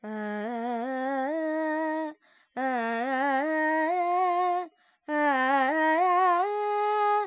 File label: Pentatonic, Vocals, Carnatic Female, Alaps, Dry